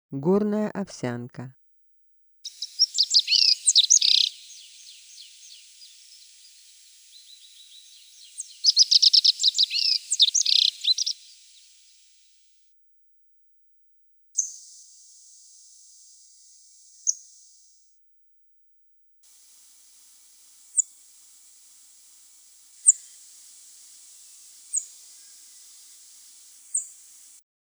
gornaya ovsyanka golos

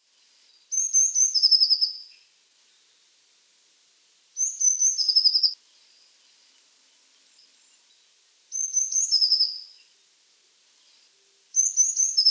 obyknovennaya lazorevka golos

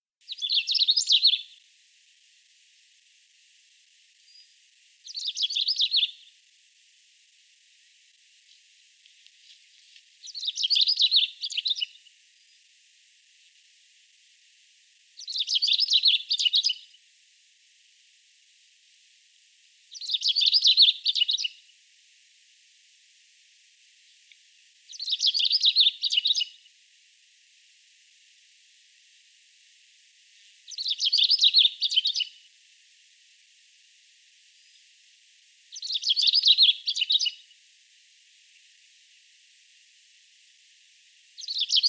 dlinnohvostyy snegir golos

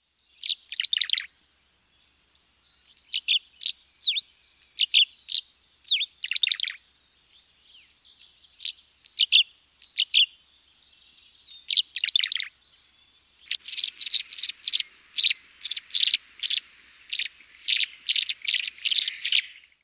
rechnaya lastochka golos

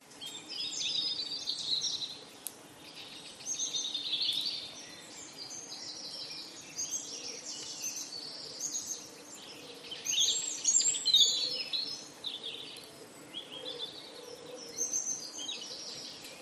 zvuki utra

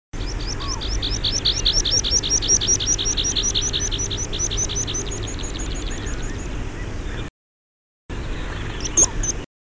lugovoy konek golos

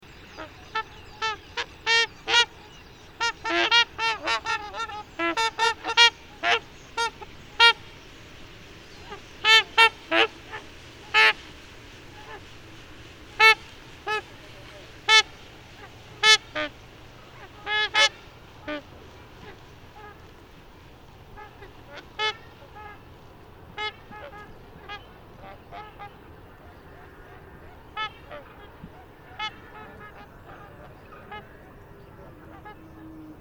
lebed trubach golos

